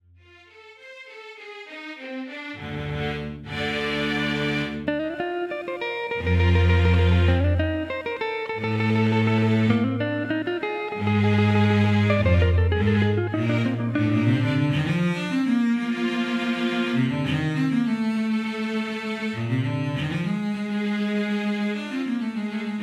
symphonic in ragas Bhavapriya

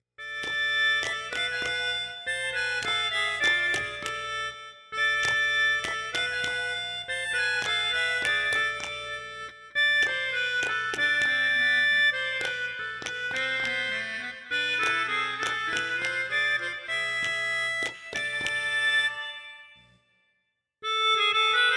Symphony in Raga Ramapriya

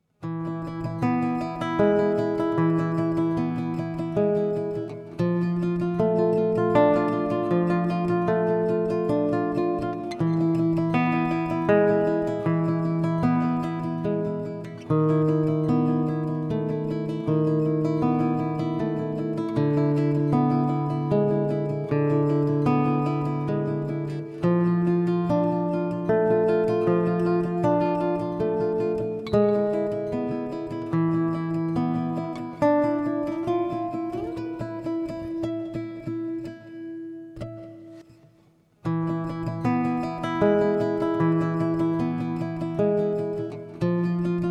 Symphony in Raga Hatakambari

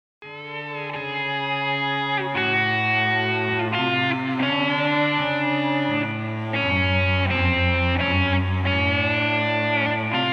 Symphony in Raga Rasigapriya

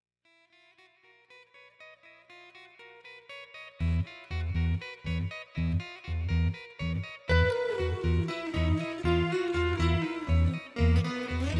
Raga Pavani

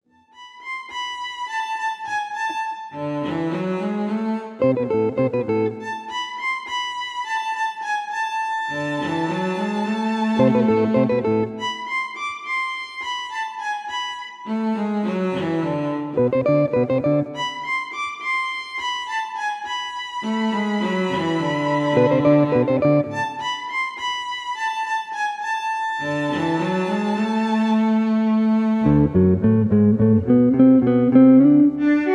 Symphony in Raga Vachaspathy

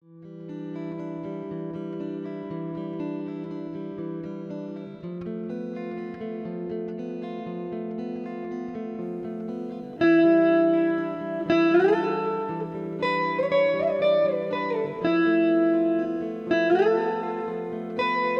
Symphony in Raga Natakapriya

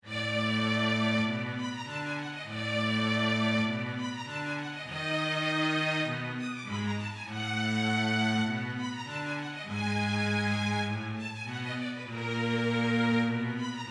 Symphony in Raga Dhavalambari

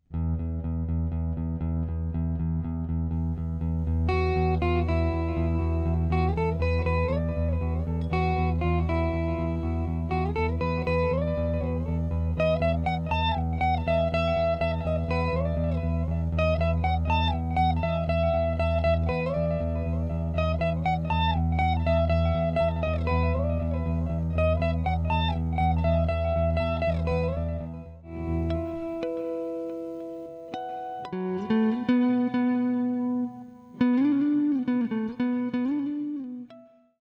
Symphony in Raga Tanarupi

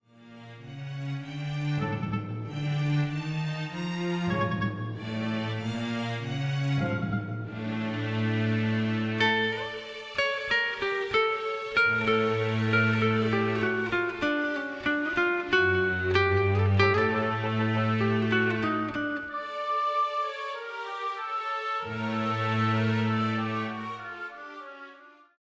Symphony in Raga Gangeyabhooshani

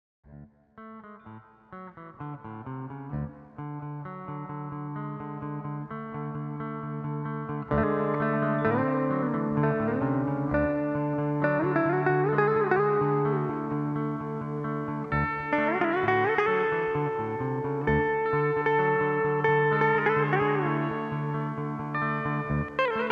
Symphony in Raga Chitrambari

